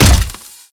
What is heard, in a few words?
Step; Warframe; Corpus; Arachnoid Wraith; Steps